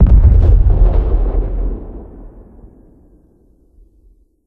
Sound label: Explosion Car Nuke Dist, War Ambience